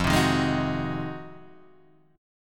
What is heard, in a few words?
F Minor 7th Flat 9th; Fm7-9 Chord {1 3 1 1 4 2} strummed